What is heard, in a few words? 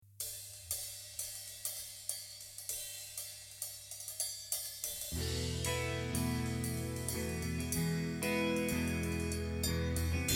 broken w keys sample